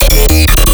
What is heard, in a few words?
Bass Tech Glitchy 160 D#